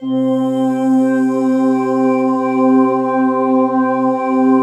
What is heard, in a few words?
pad, 200 BPM, Drum & Bass; Ueberschall; Drum & Bass Resonance